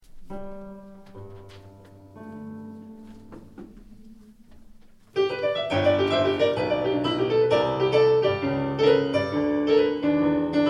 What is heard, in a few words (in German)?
7 Stueck vom Glueck Chor